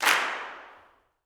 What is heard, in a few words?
CLAPS, Gospel; ILIO; Vocal Planet